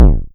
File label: Vermona Kick; techno drum fx; Analogue DrumHits